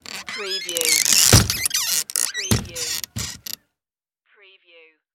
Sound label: saloon swing door